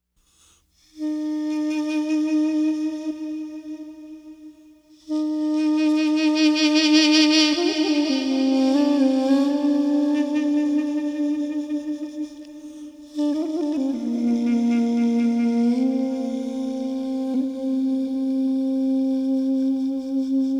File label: Instrumental